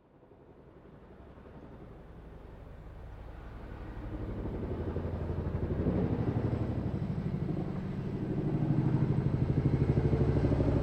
Helicopter Military, Aircraft, Helicopter, Military, Pass, By; Transportation